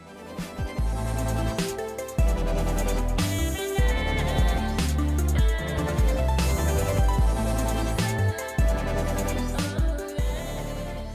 New Classical Tone

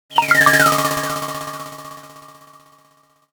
Huawei P40 Pro Notification

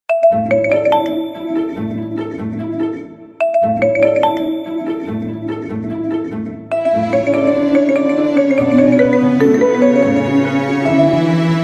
Honor, Huawei Ringtone

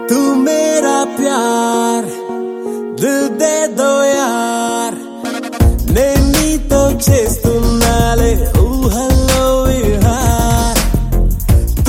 Song ringtone

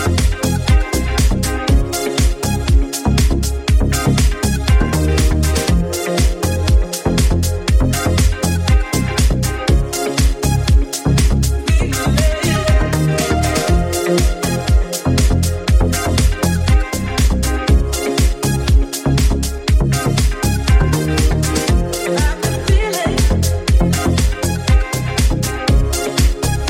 Samsung Remix Tune

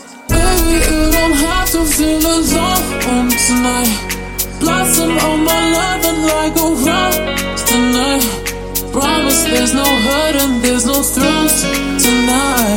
bell english ringtone

